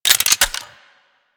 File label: Double Barrel Shotgun Chamber Open; Weapons